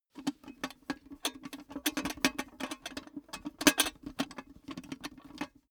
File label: cash box metal handle; maschinen; soundpool